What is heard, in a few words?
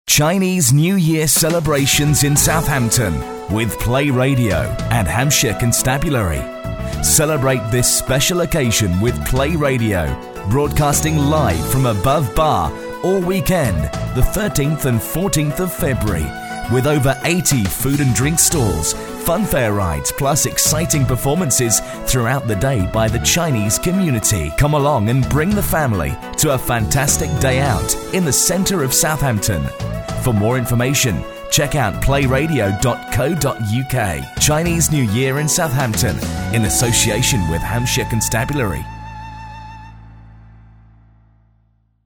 chinese NY